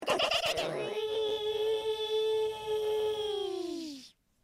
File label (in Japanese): クルマのエンジン; 汽車的引擎; 声マネキング; 声マネキング対決; フブみこさん; ホロライブ; さくらみこ